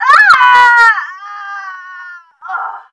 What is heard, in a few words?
death; sydney